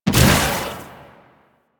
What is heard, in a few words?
Spear Shot, Weapons; Warframe; Tenno; Priest Spear